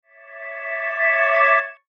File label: Logo Crescendo Ring Pad; Sonic Logo; Blastwave; Sonic Logos; Production Element Imaging Element Accent Transition